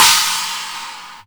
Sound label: modern roomy crash cymbal b key; cymbal drum samples